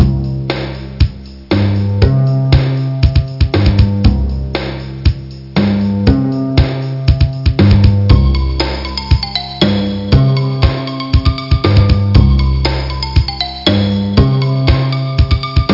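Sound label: percussion loop; avr loop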